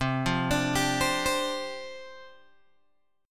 C Suspended 2nd Suspended 4th, down, Guitar, Open G